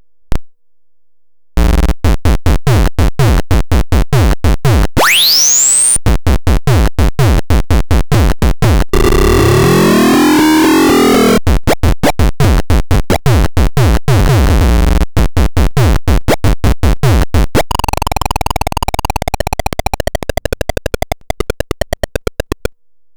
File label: Drum Machine; arduino